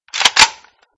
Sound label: reload; SniperLaya